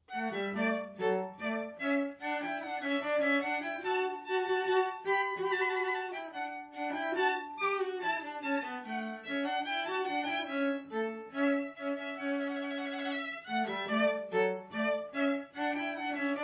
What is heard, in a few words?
Rondeau, violin cello